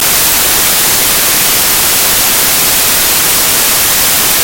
tv fora do ar

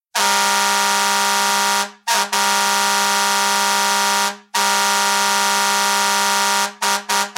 buzina de trem

